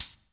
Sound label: tambrine